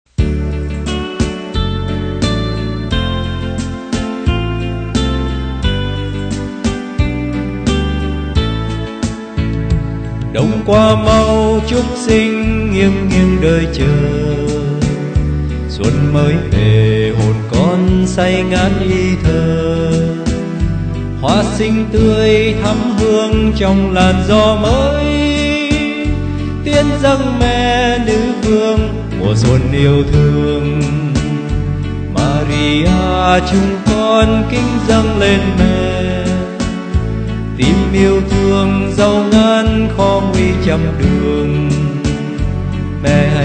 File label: bass